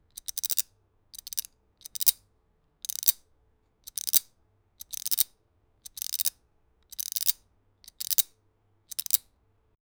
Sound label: fast opening and closing, knife